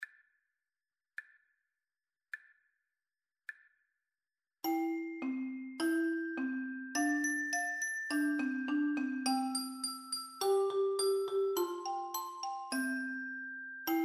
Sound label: Ding Dong Diggi, 3 parts